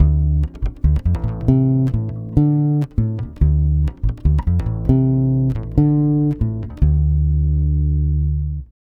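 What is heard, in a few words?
140 BASS D minor 7, Brazil Bossa; USB Soundscan; AKAI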